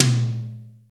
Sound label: Acoustic Tom Drum B Key, Royality free tom sound tuned to the B note. Loudest frequency: 2316Hz